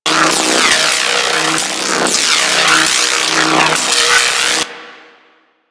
Broken Light Electricity Loop; Warframe

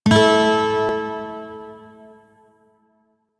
Shawzin Chromatic Chord J; Warframe; Lotus Shawzin

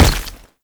Heavy Step Dirt, Footsteps; Warframe; Tau Dax

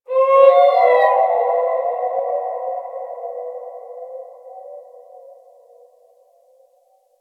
Ocean Underwater Creatures Medium; Ambience; Warframe; Grineer Ocean

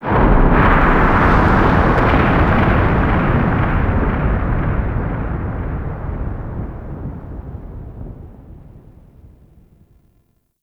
Environmental Booms; Ambience; Man In The Wall

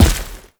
Heavy Step Dirt, Footsteps, Foley; Enemies; Warframe; Tau Dax

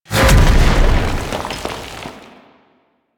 Jump Heavy Land; Warframe; The New War; Archon; Amar

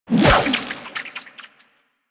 Fishing Spear Line Retract; Warframe; Venus Fishing Spears

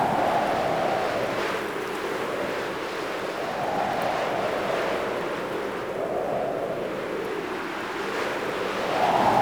Sparrow Death State Flight Wind Loop; Animals

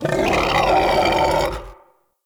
Battle Dog Death; Animals; Vocals

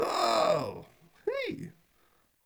Mustache Sprout Amb Vocal; Friendly; Sproutfolk; Fey Hub Sprout

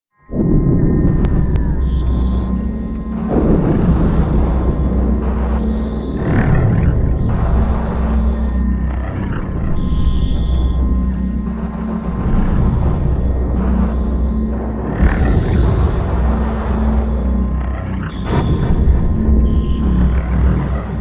Background Machinery E; Warframe; Ambience; Grineer; Grn Boss Room 1

